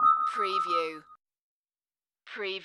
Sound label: Hip Hop Dark Rhodes Licks 90 BPM Straight - E